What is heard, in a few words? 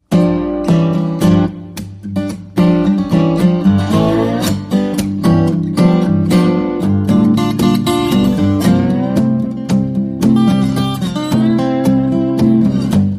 walking blues